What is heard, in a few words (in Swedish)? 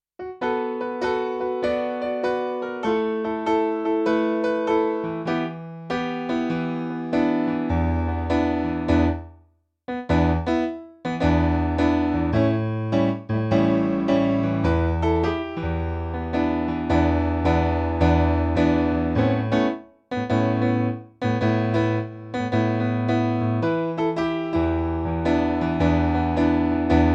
Endast piano